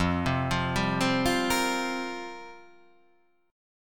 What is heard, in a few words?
F Suspended 2nd Suspended 4th F down